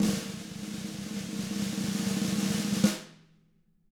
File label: TAMA BR RL, Drums; ILIO; Double Platinum Drums 1